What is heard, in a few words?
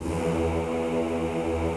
E1-R, Classical Choir; Best Service; Extended Classical Choir